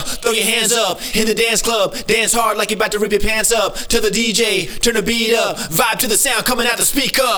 Handsup Main A, electric jazz

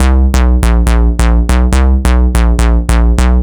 Squares

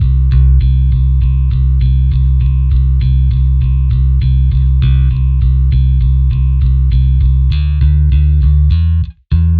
Bass, metal метал

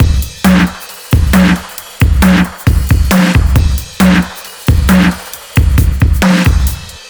Stereo Kit; Барабаны

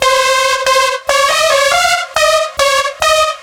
Blower, hard style; Звук синтезатора; Hardstyle Synth; 140BPM; loop

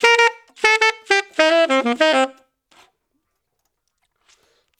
Brass C, духовые

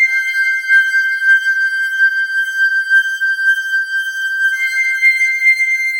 Glass Harmonica; Ambient

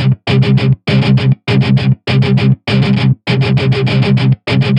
Charisma Rhythm Guit, сэмпл гитары метал; Rhythm Guitar

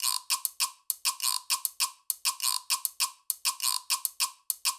Cuban C, перкуссия

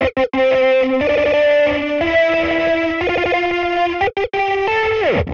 Lead FX

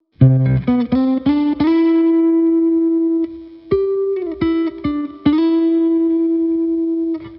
Flattop, latin

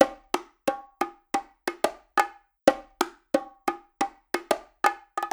Onbeat

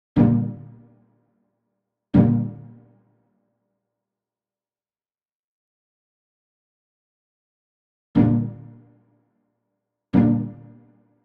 Bass Pizzicato Accent; звук для фильмов и видео; бас пиццикат

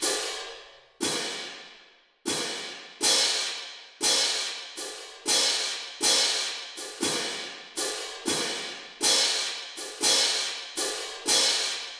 Piatti Cymbal

